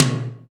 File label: TOM TOM, Real